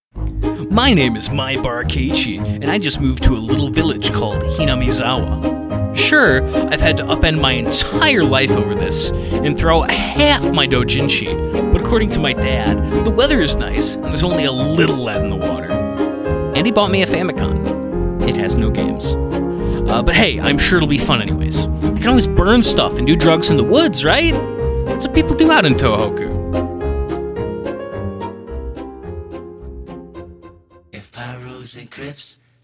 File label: Air Pizz